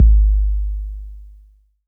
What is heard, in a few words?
BD 808 Tape Decay